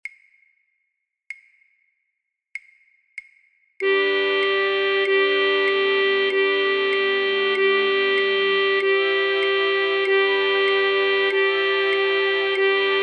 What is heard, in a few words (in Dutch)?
Musette flute choir basfluit; voor-oefentracks; Rameau; Platee